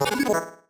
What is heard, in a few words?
marimba dice; ゆめ2っき